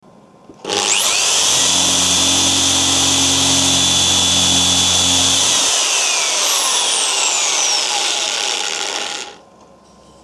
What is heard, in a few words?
Sub drilling